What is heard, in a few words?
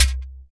Shekere